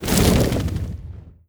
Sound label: fly end, antlion wings; creatures